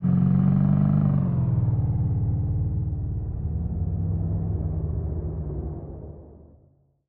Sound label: drive by fake; netent; narcos